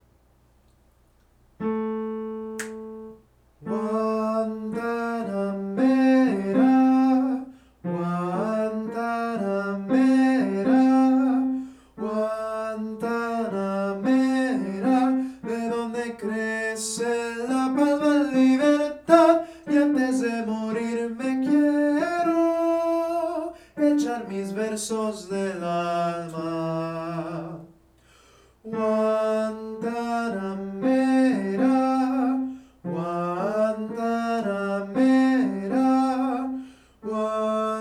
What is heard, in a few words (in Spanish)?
soli tenor